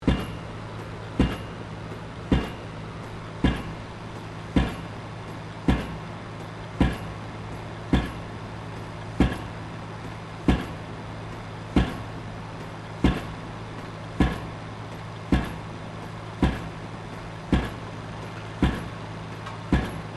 Industrial Pile Driver Operating Stereo; Machinery; Machines

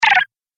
Arcade Game Fast Beeps; Video Game Sound Effects; Retro Inspired; Nintendo, Atari, C64

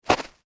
human footstep snow icy; Single Footstep On Icy Snow; McKinney Sound

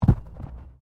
Body movement Fall Medium

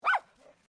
Animal Dog Poodle Miniature Bark

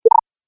Interface Bleep, beep sound effects

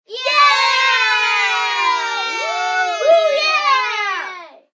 Kids Group Cheer, Group of children

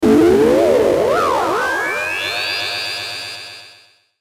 Descend Ascend Distortion; Descend Ascend Sci-Fi Distortion; Descending Sound Effects; Blastwave

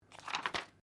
Book Page Heavy Turn; Book movement; Books (Sound Effects:Offices)

